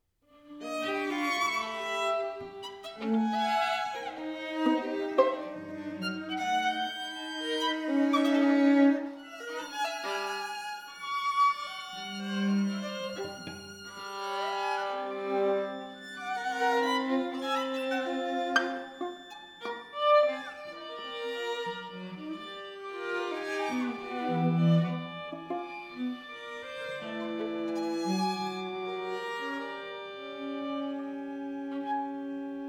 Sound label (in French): Quatuor; Boulez; Livre Pour Quatuor Révisé